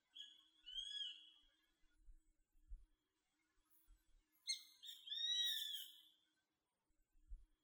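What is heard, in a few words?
grey-faced buzzard call; sashiba nakigoe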